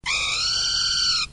Grito

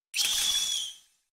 Grito de Arctozolt

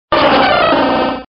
Grito de Slowking

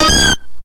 Grito de Igglybuff

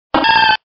Grito de Bayleef

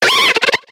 Grito de Simisage

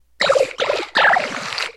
Grito de Sliggoo

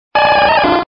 Grito de Squirtle; Grito actualizado de XY

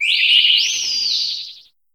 Grito de Marshadow

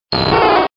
Grito de Dratini

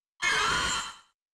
Grito de Cursola

